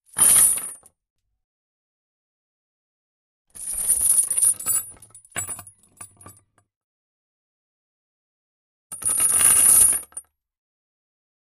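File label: Chain Fast Drops, chains; Chain, Fast Drops x5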